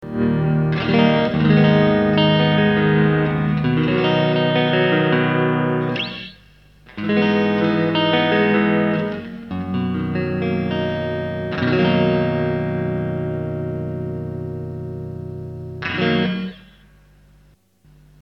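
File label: BOSS RV-5 Digital Reverb, GATE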